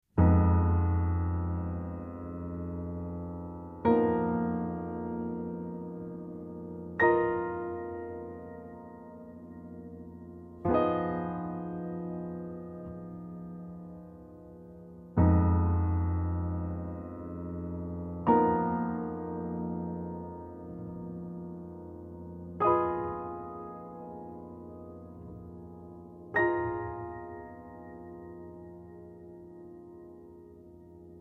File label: Fmin7b5